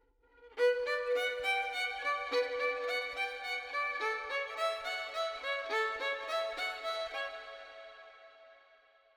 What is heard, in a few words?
FX 4-4 Bm 70, Melody, Violin